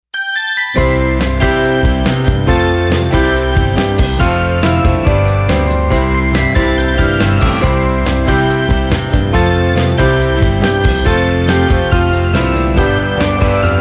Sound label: Instrumental; Kids Liturgy; Year A